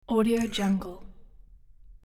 metal dial switch with turning scrapes; hollywoodedge

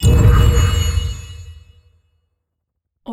fantasy organic magic poof buff hit; esmteam

